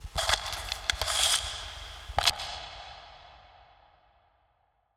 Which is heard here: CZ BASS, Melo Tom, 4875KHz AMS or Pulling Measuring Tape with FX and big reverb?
Pulling Measuring Tape with FX and big reverb